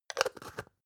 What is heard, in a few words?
spice jar scratch close; industrie wirtschaft; gastronomie